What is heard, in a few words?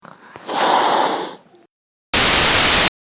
hiss; secondlife